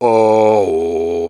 Grunts and Chants, Viking Vocal

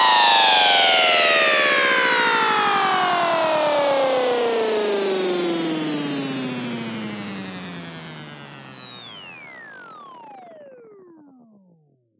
SFX Down, Dubstep; Ghosthack; Dubstep Sound Arsenal

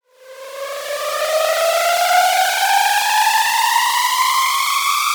Murkiness Riser FX, Dubstep; One-Shots; Effects; Ghosthack; Dubstep Sounds 2025

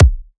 Kick, dubstep

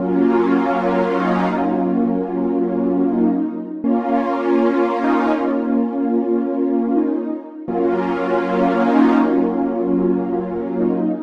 125 BPM A# Min Pad; Melody; Loop Stems; Cymatics; 2022 Melody Collection; Wavy; Power

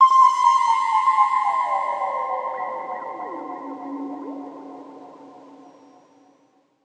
FX Descent

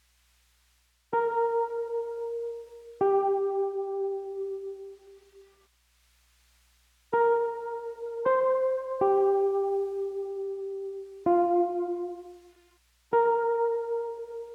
80 BPM C Min Lofi Guitar; Bordeaux

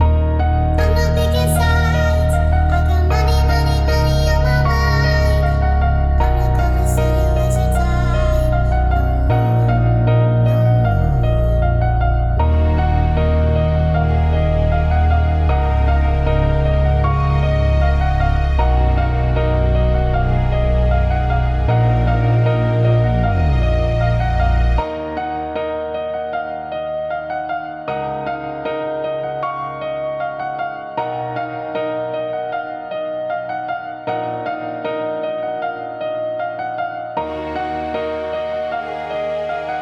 155 BPM D# Min, Trap Melody Loops; Money Mind; Cymatics; Nightmares Trap Melody Loops

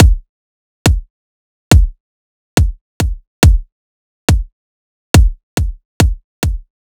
Riddim Drum Loop 140 Kick

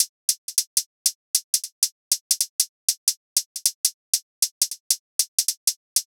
Hihat Loop - 156 BPM, Hip Hop; Equal

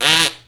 Raspberry; Vintage Cartoon; Comedy Vocals

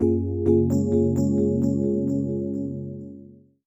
PHRASE, 131 BPM F# Maj; Ear Candy; Inside; Cymatics; GHOST (Beta Pack)

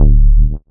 Bass D, techno synth shot; Bass One-Shots; Ghosthack; Techno Synth Shots 2025

